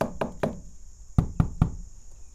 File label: perc